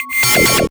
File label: FX Machine C3, Club Techno FX; Zero-G